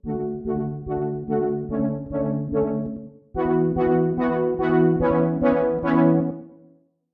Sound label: prechorus